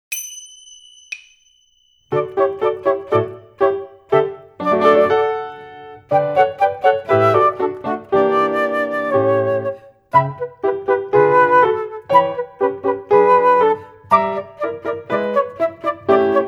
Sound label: Dance Of The Reed Flutes